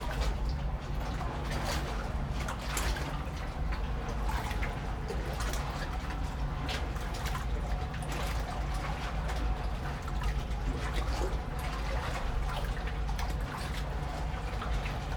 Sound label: Urban island small town harbour water lapping against harbour wall and ship hull, Adriatic sea; Northern Mediterranean; Ivo Vicic